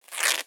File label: cloth fiber rip